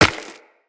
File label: Run Rock, footsteps; Operator